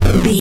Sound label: retro impact hit general